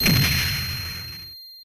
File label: Coin In; seibu spi system arcade; Viper Phase 1